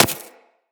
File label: Laser Impact Generic